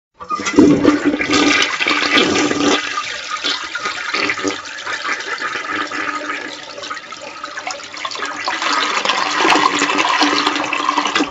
toilet flush

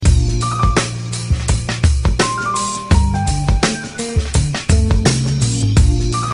piano riff

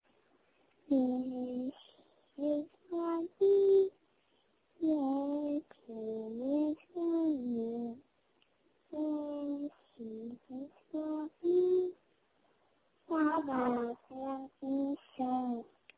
bedtime singing

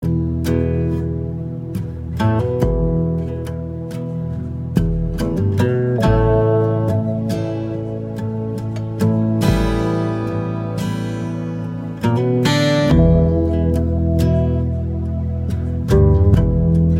best ringtone

